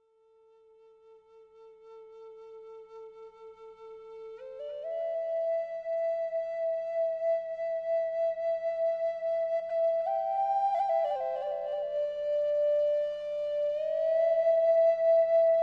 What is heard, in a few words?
Flute